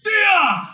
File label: sword big swing; swordv big swing2